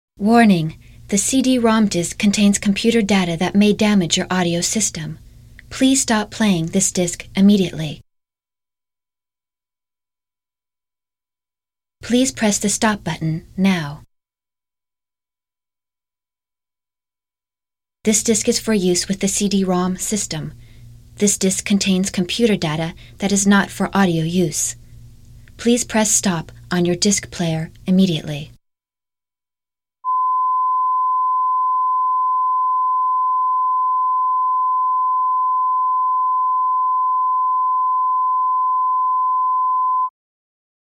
Music, Turbografx; Theron's Quest (USA, Turbografx) - Track 01

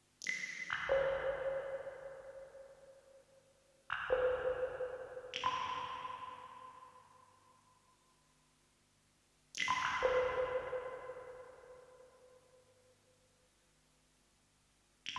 Music; Theron's Quest; PC Engine

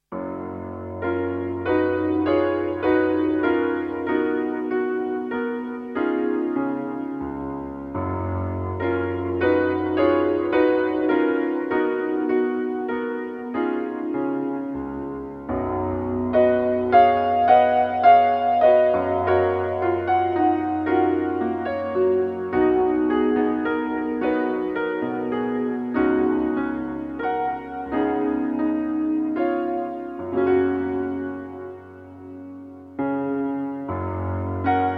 Music, PC Engine; Theron's Quest (Japan, PC Engine) - Track 18

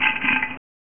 Amiga Move Skeleton Sound; Dungeon Master and Chaos Strikes Back

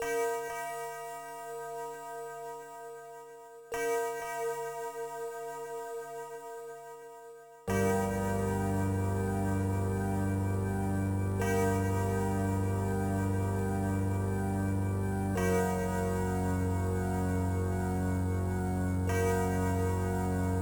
Music; Dungeon Master II; Amiga

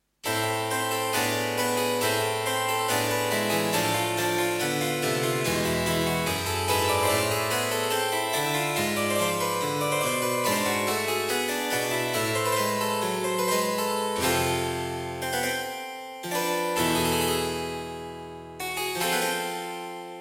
Music; Theron's Quest; PC Engine

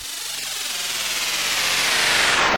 Swoosh

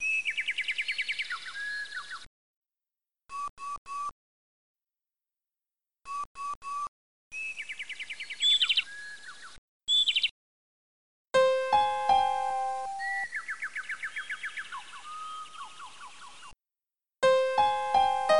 Music; Dungeon Master II; Macintosh

